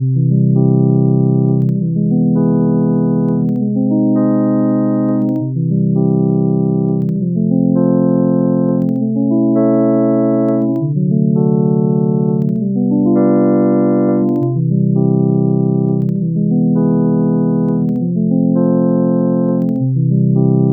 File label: twelve tet rooted ji c drone progression